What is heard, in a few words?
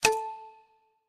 btn Standard line Up; slots; Pirate Quest